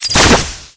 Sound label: Create bomb